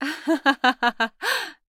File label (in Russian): Смех